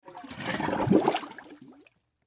Submerge Object, Water Submerge Object Gurgle Fill; Nature; Blastwave